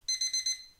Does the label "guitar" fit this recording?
No